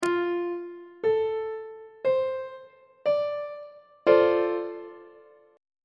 c double sharp minor 7th 1st inversion on treble clef